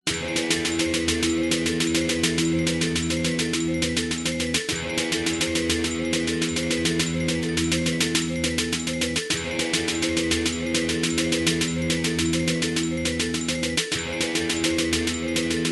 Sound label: battle; Dink Smallwood HD